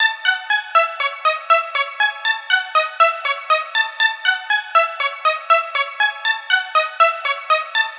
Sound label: Trance Synth; Sonic Foundry (Sony Creative Software); Trance NRG VOL-2; Trance Synths